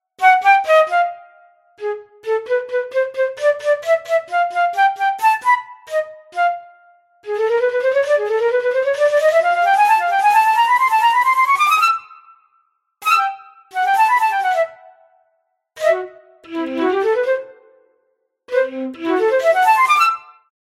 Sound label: Flute Woodwinds Flute Staccatissimo; Syntheway